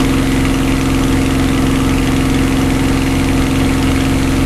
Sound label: spitfire cockpit l, engines; War Thunder